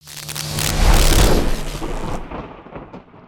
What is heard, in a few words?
maelstrom strike; creatures organic